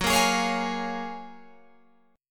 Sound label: Gb Major 9th, down, Guitar, Open G